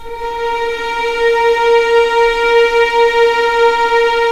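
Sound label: A#4 legato violin, left, The Ultimate String Collection; East West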